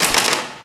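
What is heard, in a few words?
physics clipboard impact small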